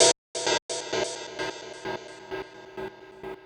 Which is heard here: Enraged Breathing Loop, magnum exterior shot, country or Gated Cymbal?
Gated Cymbal